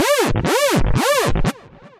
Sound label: saw madness, effects